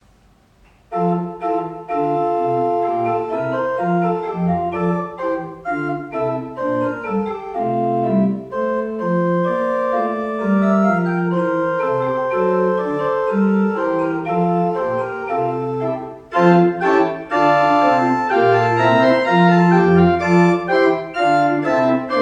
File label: Klais, pipe organ